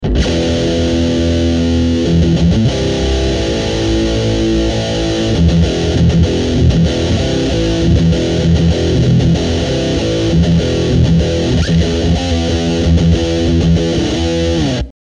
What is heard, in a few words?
Midless Metal